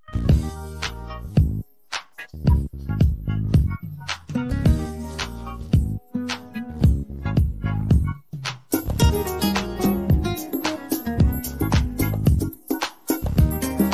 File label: no vocals